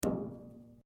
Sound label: RUBBER ON METAL; Sound Effects:Materials